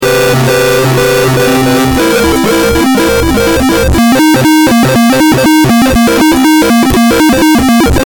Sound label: loop